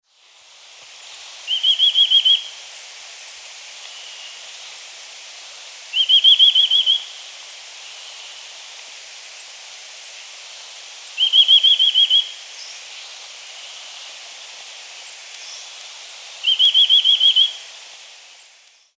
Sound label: chestnut vented nuthatch